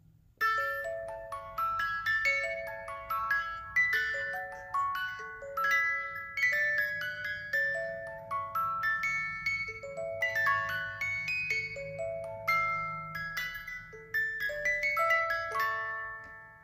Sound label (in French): 18 lames, boite musique; manivelles